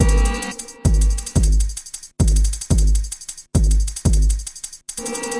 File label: intro suspenders, drum loops and breaks, 178 bpm; 161 to 180 bpm